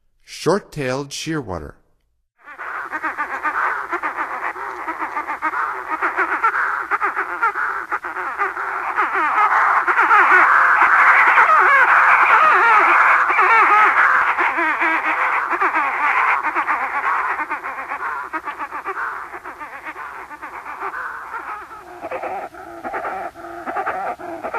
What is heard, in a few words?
Short-tailed Shearwater; North America; Stokes